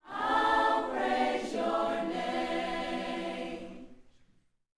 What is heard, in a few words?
Vocal, Gospel, PHRASE; Spectrasonics